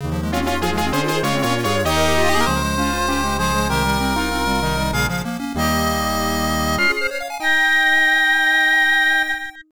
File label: Character Joins; Shining Force 2 Genesis